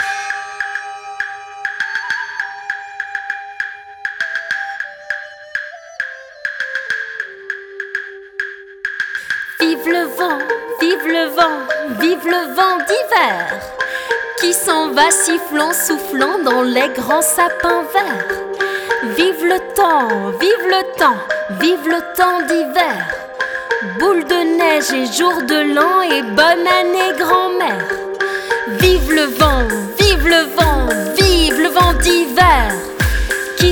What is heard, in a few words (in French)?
Vive le vent chant, Version chantée